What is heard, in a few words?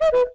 cuckoo